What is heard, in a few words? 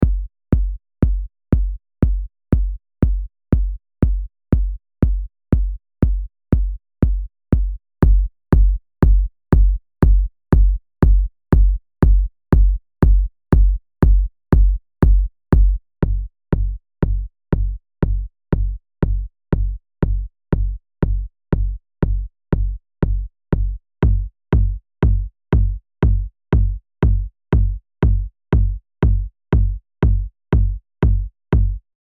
Dist Tube Culture Kick; ARTURIA